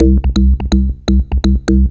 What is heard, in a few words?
arp 125; Best Service ProSamples vol.10 - House; AKAI